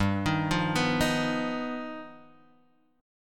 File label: G Augmented 7th, Guitar Drop D